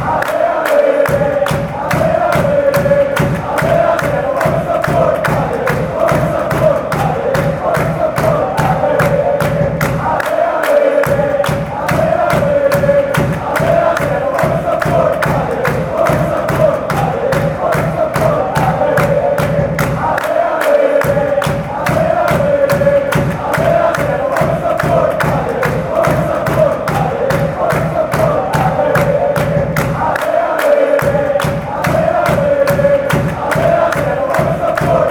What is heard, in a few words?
allez allez go porto allez, chants